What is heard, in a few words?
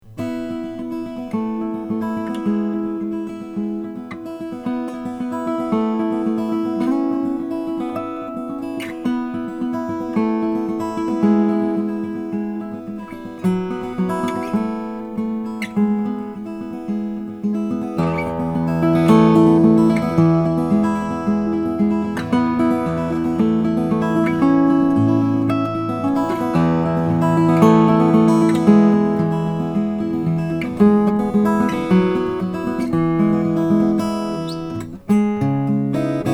claxton emc brazilian rosewood